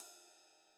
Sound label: hi ride, gretsch kit